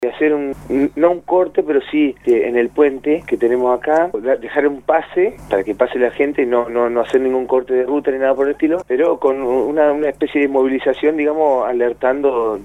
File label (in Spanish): marcha